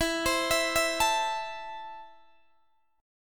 E Suspended 4th Sharp 5th E, Guitar, Open D; down

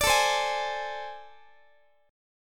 A Minor Major 7th Double Flat 5th, Guitar; AmM7bb5; strummed; chordshape; x,x,17,19,16,19

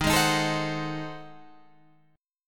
G sharp 7th Flat 9th D sharp, chord

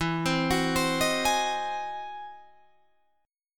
E Augmented Major 9th, Guitar, Drop D

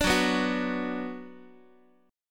F sharp Suspended 4th Sharp 5th, Guitar; strummed; x,x,5,4,3,5; down; 4A,4E,5A,5D,5Gsharp,6Csharp

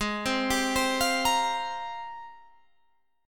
G sharp Major 13th; G#M13 Chord

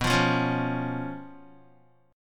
B Suspended 2nd Flat 5th B down Guitar

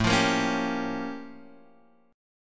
A Augmented 7th Flat 9th A; strummed; 1,3,sharp5,b7,b9; JGuitar

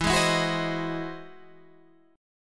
E Augmented Major 9th, Guitar, Half Step Down

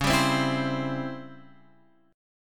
Db Minor Major 7th Flat 5th Db down Guitar Open F; DbmM7b5 strummed; JGuitar

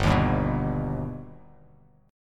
G Augmented; G+ strummed; JGuitar

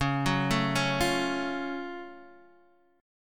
F sharp Minor Major 7th

